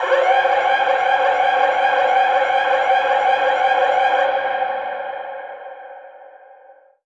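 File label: alarm siren, echo processed, 170bpm; musicradar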